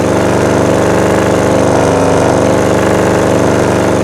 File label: gauntlet mid, simulated vehicles; gta5